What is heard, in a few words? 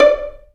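Vio pizzicato D4; Digital Sound Factory Virtuoso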